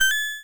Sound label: pickup; Ulmos Adventure; pygame